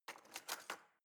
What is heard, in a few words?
knife wood drawer grab soft